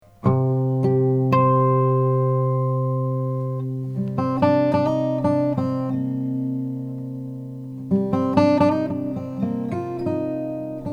Froggy Bottom baritone madagascar

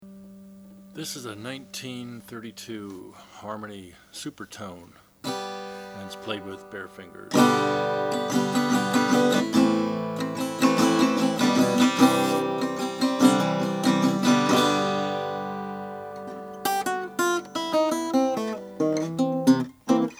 harmony supertone natural; 1932; island stenc

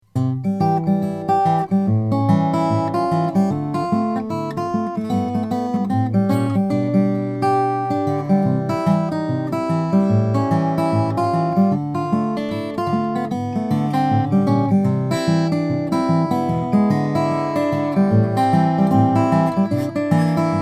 charis sj cutaway amazon rosewood

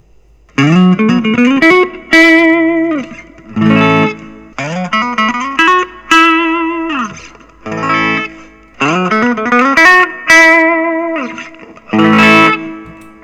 gibson historic 59 lp brazilian rw

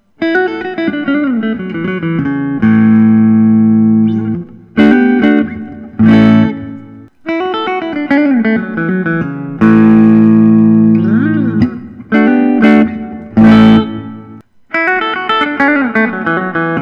Collings I 35 LC deluxe merlot

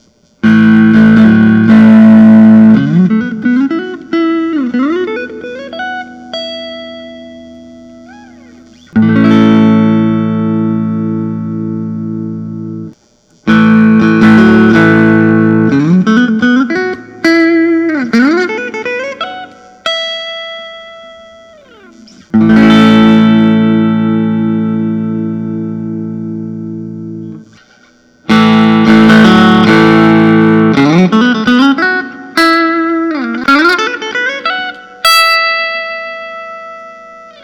fender telecaster

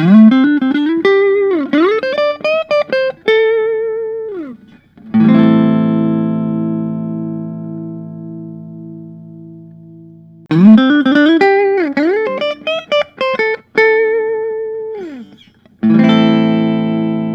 fender usa telecaster thinline

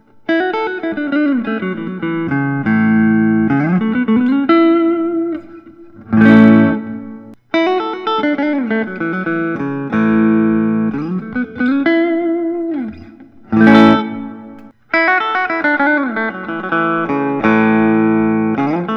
PRS McCarty 594; dark cherry burst

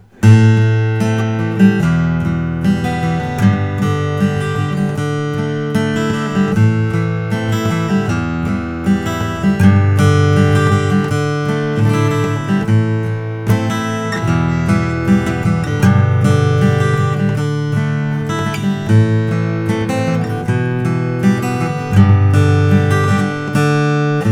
flammang gc50v l

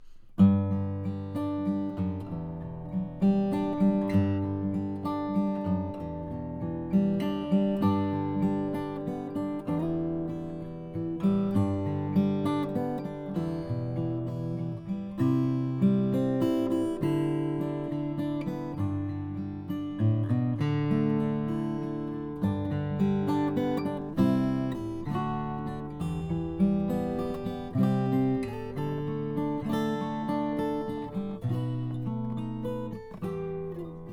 froggy bottom m dlx sunburst

